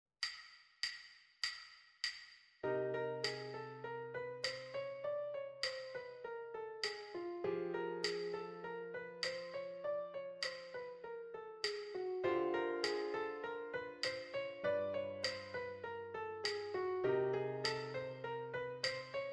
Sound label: Chr. scale g-d